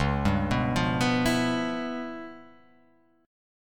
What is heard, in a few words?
Db 7th Sharp 9th Flat 5th Db; arpeggiated; 9,10,9,10,11,11,x,x; JGuitar